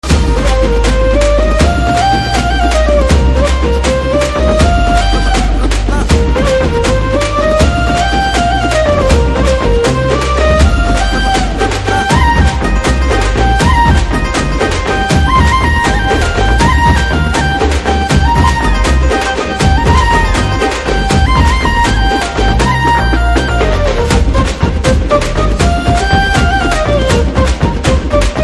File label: Flute BGM Ringtone